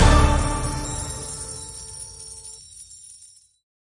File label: Total Win Jump